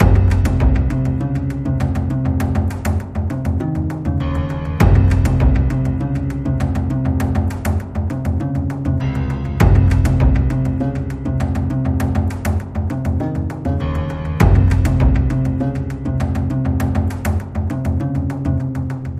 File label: Clockwork, Loop